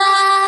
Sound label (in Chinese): G5 平 啊啊